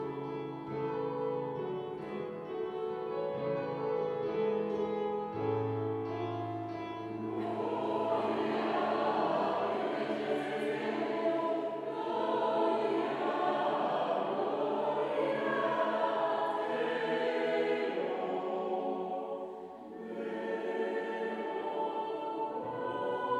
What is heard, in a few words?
Taize Gloria Deo SATB